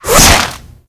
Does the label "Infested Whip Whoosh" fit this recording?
Yes